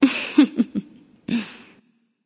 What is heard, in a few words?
heh heh; daji